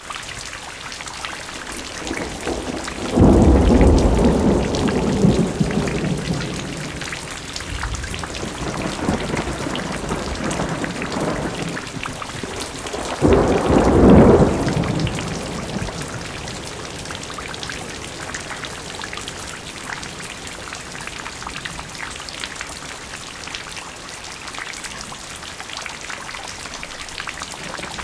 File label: rain thunder; best service Blue Box